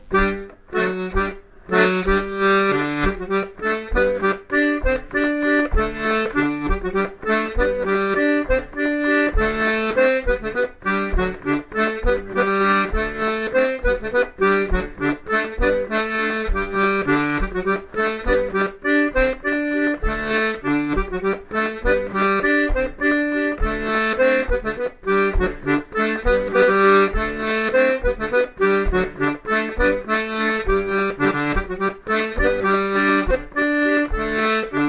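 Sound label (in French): bourree, accordéon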